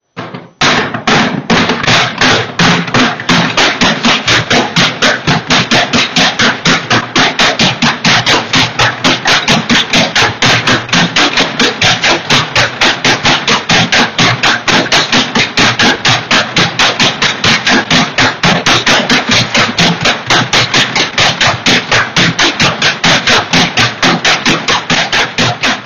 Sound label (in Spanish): Matraca o Campana de Madera Semana Santa; Callosa de Segura